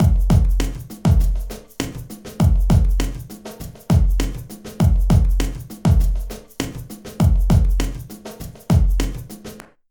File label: Hip Hop Drums Closet; PerfectSpace